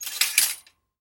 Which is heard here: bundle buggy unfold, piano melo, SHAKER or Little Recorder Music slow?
bundle buggy unfold